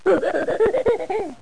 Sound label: Hi Monkey; Bible Fun Pack; Education Publishing Concepts; NOAH